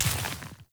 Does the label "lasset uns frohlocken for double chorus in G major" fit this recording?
No